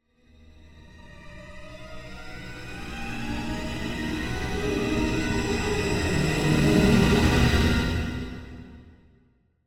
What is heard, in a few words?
Symphonic Hit Drawing Close To The Fear - Medium Pad; Hollywood Edge; sound designer's toolkit v2